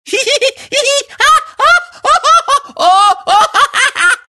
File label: laugh; gwtaunts